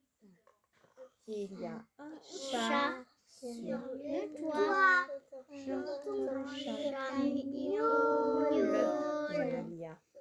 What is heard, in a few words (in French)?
Chat